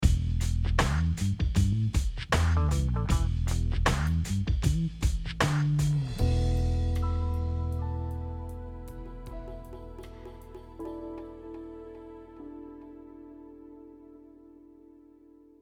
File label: funk; film cues; Judging Amy